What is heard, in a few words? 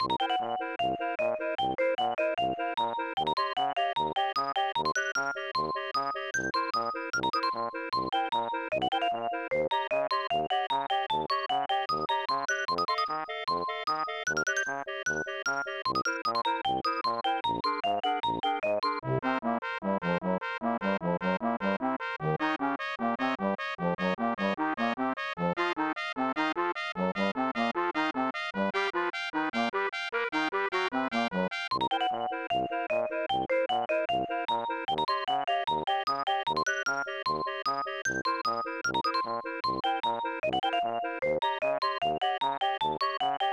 Mouse Maze Level Music; fun n games 1994 snes